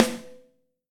Snare

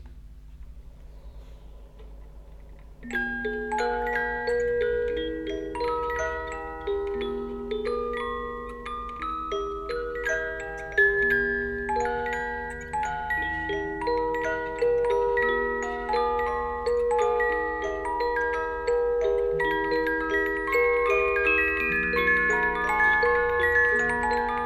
Symphonion Klosterglocken

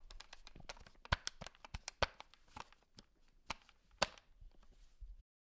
Handeling Of Gun

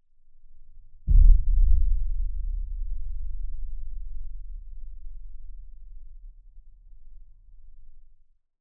Very Deep Metal Boom Sound Geofon

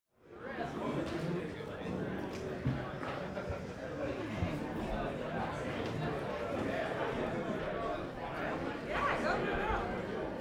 Crowds

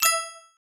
Collect Point

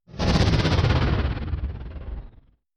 Magic Flutter

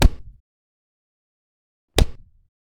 Blunt Force Impact

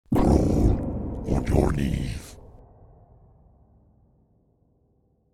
Demonic Vocal

